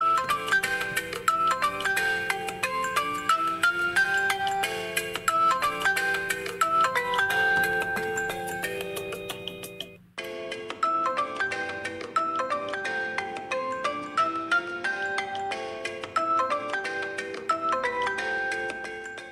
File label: Asus ringtone